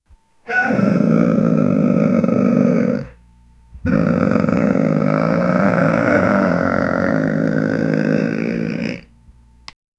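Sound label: old but mighty man grunting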